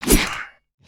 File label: combo; creatures organic